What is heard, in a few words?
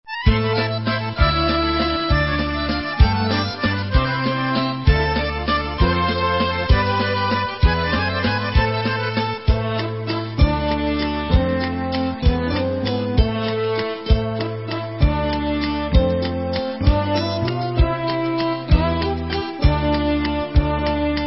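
Instrumental, Israeli Dances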